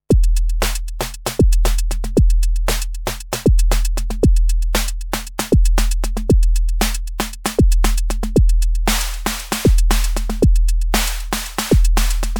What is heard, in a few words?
Akai Tom Cat Snare